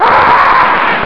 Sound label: death; alienarena; doomunls